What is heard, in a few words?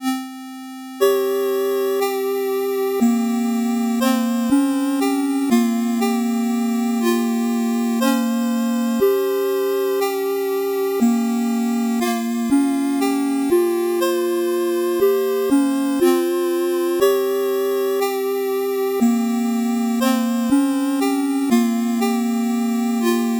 8bit bgm slow